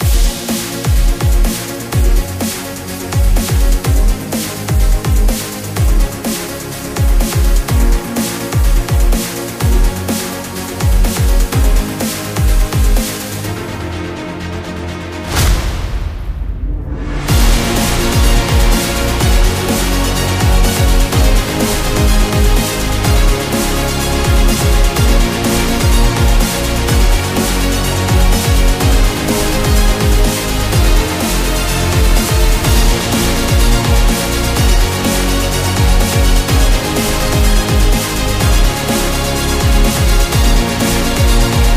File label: Trailer Dubstep